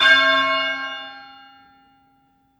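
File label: bell no prime; cccbr; glossop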